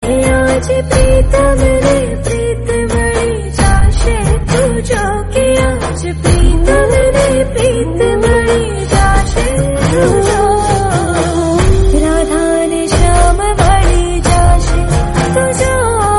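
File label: devotional gujarati song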